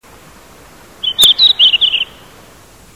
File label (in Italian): Codazzurro, uccelli